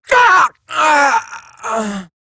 death, female; Warframe; Eximus Grineer Female; Battle Barks

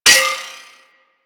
Shot Projectile Bounce; Disc Moa

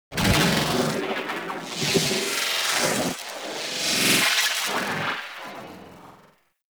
Transferrence Glitch; Gameplay; Warframe; The New War

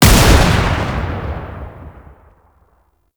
Alt Fire Shot; weapons; Scaldra AK

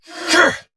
Attack Effort

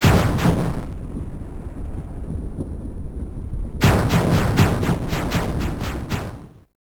Boss Explosion Transition; Warframe; Caliber Chicks; minigame

